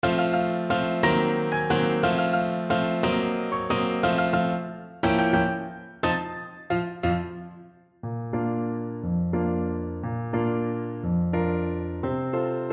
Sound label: clarinet